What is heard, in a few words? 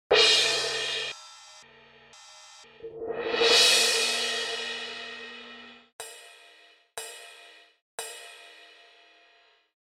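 orchestral cymbal crash ride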